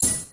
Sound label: hyperspace gem land